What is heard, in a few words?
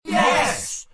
correct; readwellApps; bigbook